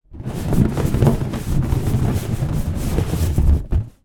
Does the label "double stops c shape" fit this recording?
No